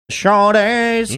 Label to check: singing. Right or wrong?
Right